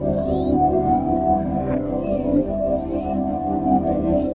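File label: Pad Synth; Equipped Music; Loungin House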